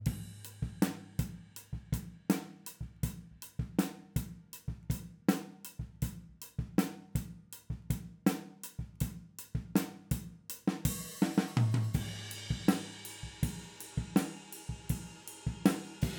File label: Overheads